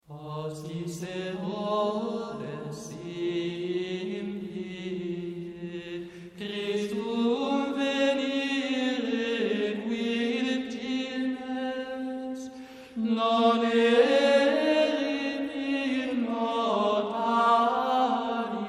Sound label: Hymne Hostis Herodes